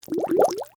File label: Water Bubbles Surface Short; Nature